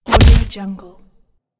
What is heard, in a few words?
ninja punch